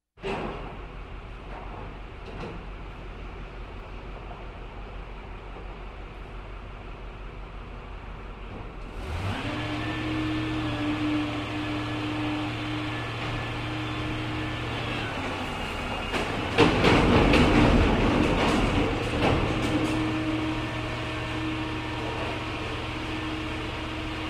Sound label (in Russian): Musorovoz